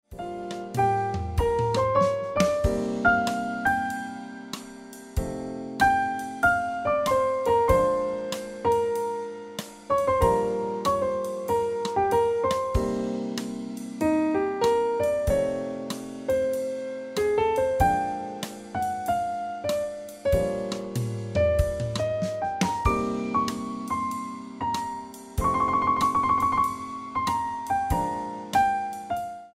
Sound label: Ringtone Piano Music